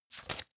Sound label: medieval page forward